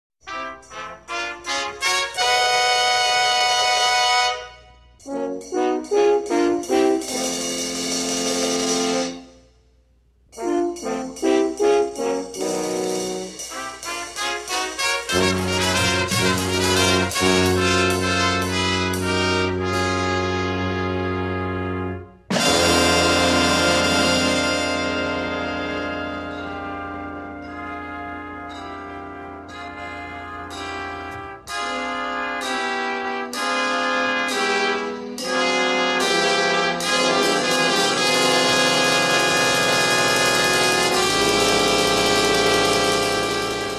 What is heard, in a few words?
Fanfare